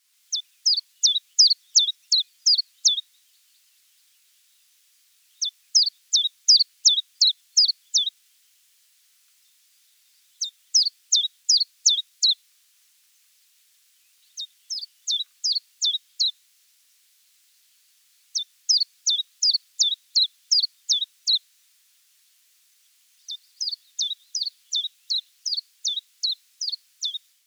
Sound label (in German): Vogel; Nature Hunt; Vogel unten links